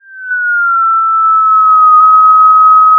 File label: Sound of a police car siren